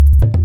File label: Techno Kick